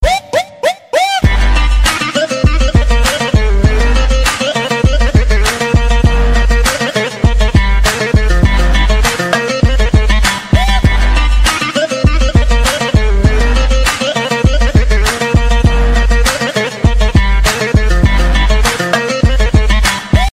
Turkish disco

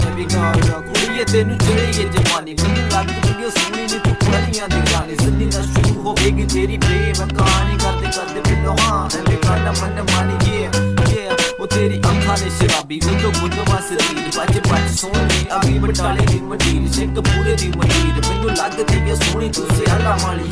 Punjabi Rap

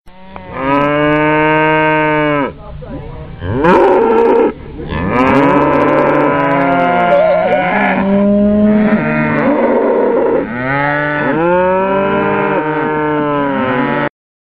Angry Cows

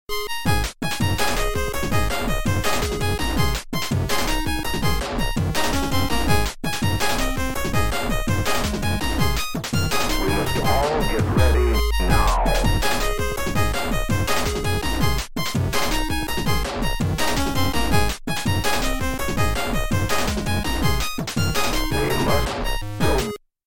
8bit; Icecream

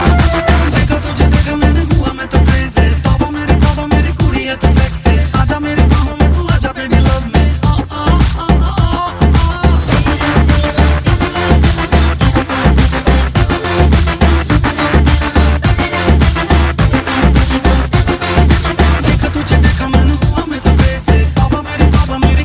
Hit Hit

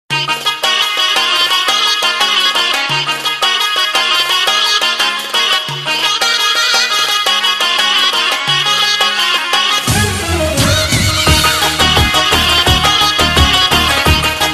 Balkan Turbo Folk

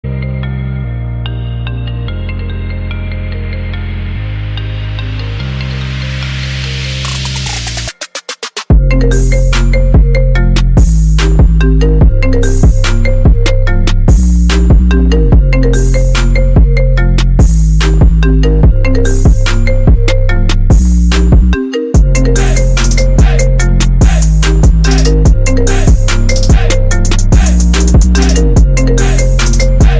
iPhone trap remix

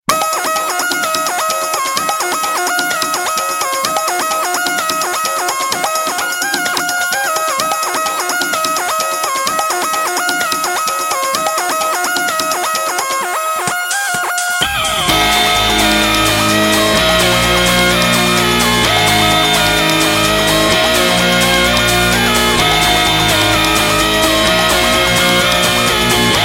Lounge

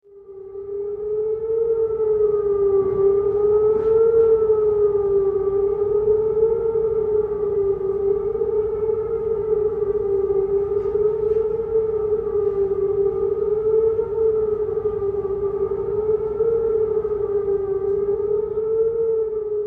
Atack Siren; Kandahar

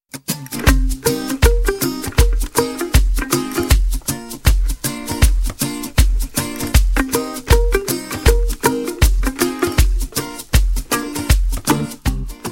Drum

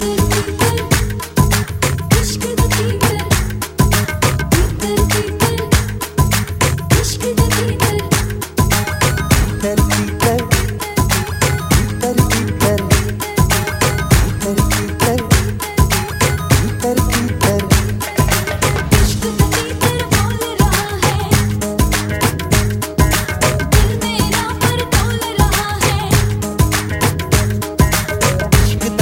Remix

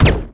Silencer

